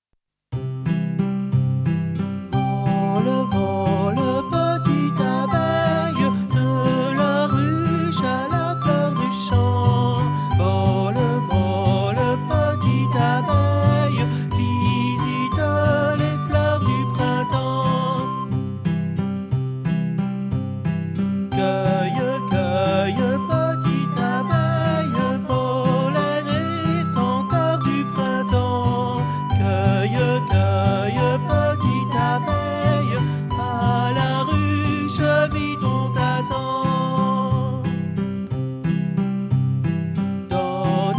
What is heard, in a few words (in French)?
Chant Miel